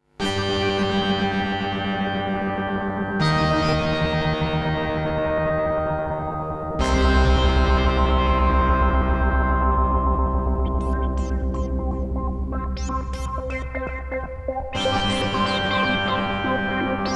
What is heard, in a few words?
Instrumental Brainstorming